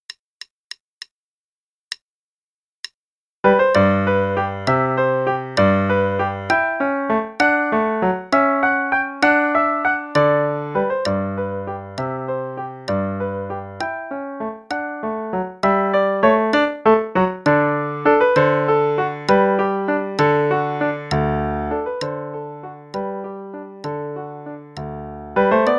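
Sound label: Play-a-long, Gigue, dotted quarter=66; Telemann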